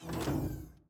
gate close; factorio 2.0.55

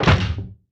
artillery large inventory move; factorio 2.0.55

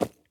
dirt, walking; factorio 2.0.55

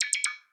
construction robot; factorio 2.0.55

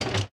locomotive inventory pickup; factorio 2.0.55

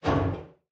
metal barrel inventory pickup; factorio 2.0.55

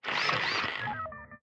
robot repair; factorio 2.0.55

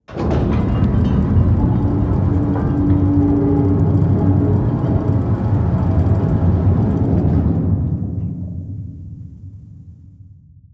silo raise rocket; factorio 2.0.55

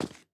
refined concrete, walking; factorio 2.0.55

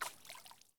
small splash; factorio 2.0.55

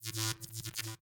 robot charging; factorio 2.0.55

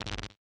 electric small inventory move; factorio 2.0.55

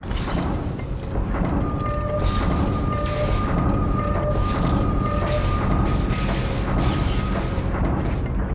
assembling machine repaired; factorio 2.0.55

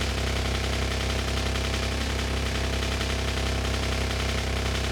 car engine; factorio 2.0.55

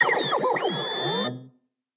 flying robot; factorio 2.0.55

